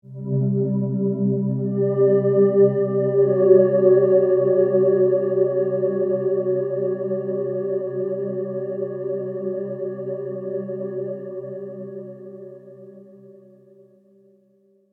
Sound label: Synth Swell Ghostly, Production, Element, Synth, Swell, Ghostly, Ethereal; Swells; BlastWave